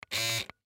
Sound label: Hair Clippers, Hair Clippers On Run Off Short; Barber Salon Razor Electric Trimmer Appliance; Household; Blastwave